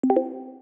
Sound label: click